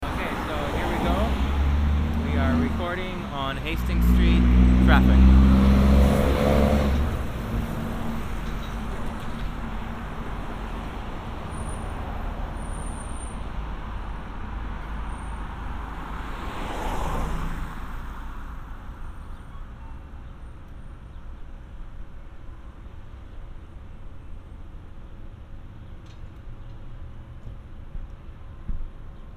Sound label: Traffic Ambience Binaural